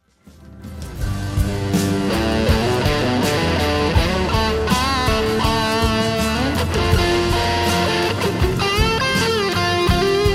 solo

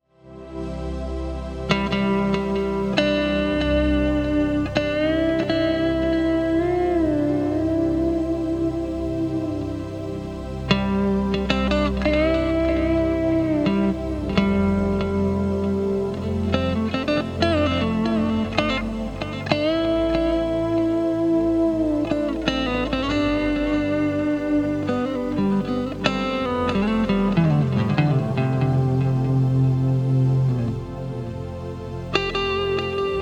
intro Pulse Tone; Dyna; CE2B

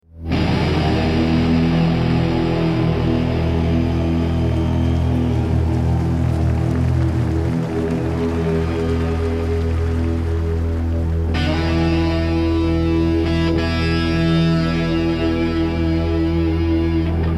Intro Rams Head Big Muff, Live Broadcast; EXH "Ram's Head" Big Muff; Live broadcast 2016

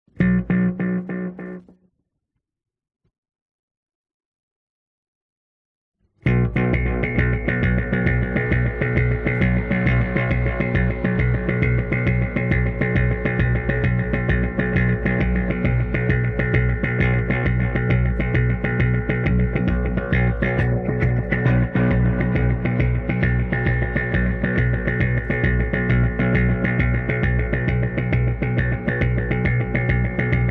Bass Guitar Track

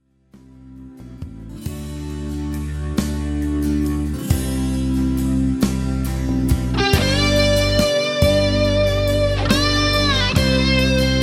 solo

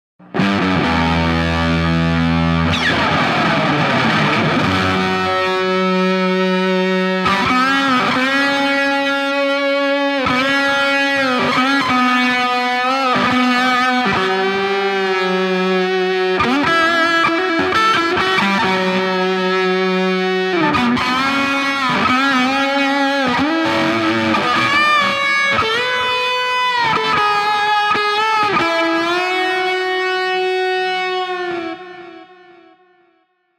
Colosound on Overdrive

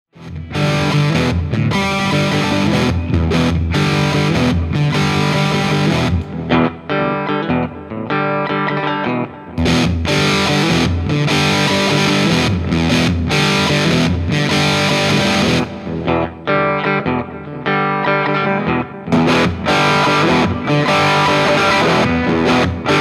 Tube Driver Muff Comparisons Chords

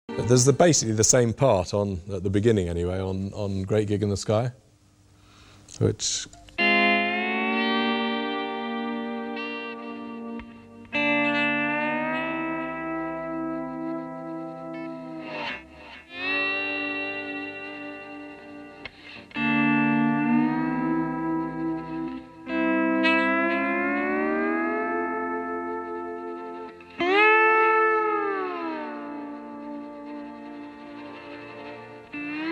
Guitars

